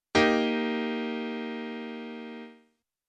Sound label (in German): Akkord; theorie